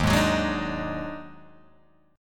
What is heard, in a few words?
Eb Minor Major 7th Double Flat 5th, Guitar, Open G